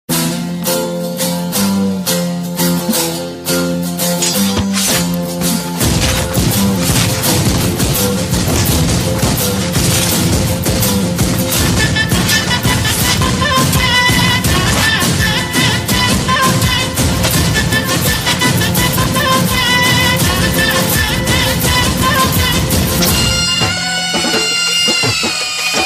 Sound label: tamil mass bgm remix ringtone; pudhupettai; dhanush mass bgm